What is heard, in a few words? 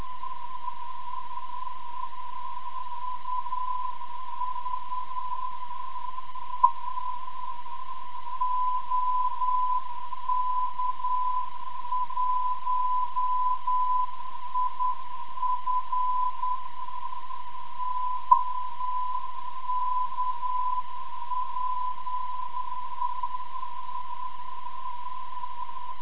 beacon 7028, OK1IF